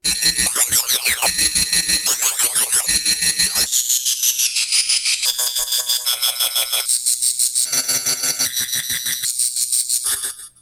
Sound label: SFX; Nate Groth